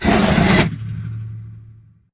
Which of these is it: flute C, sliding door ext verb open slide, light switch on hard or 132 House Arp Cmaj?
sliding door ext verb open slide